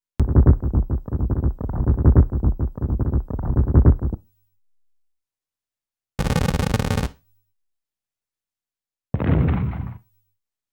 Earthquake Sound ~ Fuse Sound ~ Castle is Destroyed; super mario world live